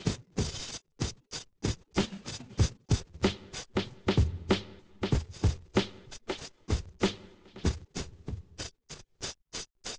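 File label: with drums inst src; SiSEC08; SiSEC underdetermined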